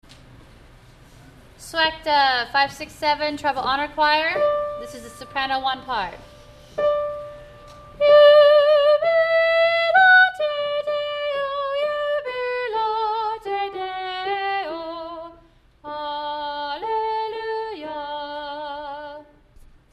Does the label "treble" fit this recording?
Yes